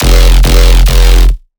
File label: Riddim Bass Hit F Rattle; One-Shots; Bass Hits; Ghosthack; Riddim Essentials Volume 2